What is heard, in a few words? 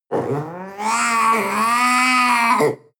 panther young vocal cry